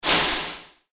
brush

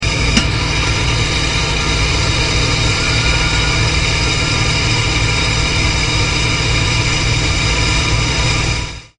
pipe

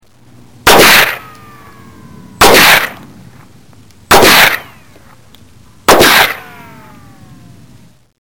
RIFLE

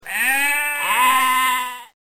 sheep

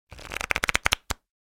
shuffling cards

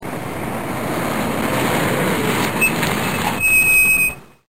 trucks

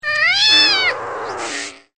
CAT YOWL

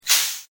AIR HISS

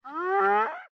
hyena die

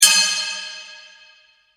anvil

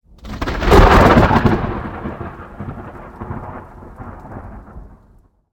thunder

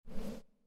zoom Out

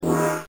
Gong

